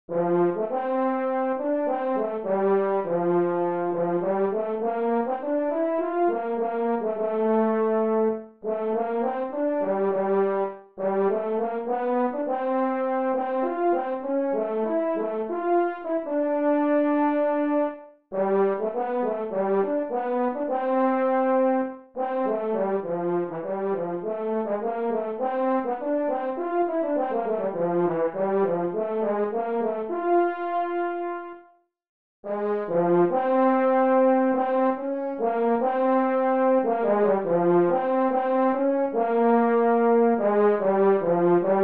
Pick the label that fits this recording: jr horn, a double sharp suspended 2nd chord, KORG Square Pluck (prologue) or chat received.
jr horn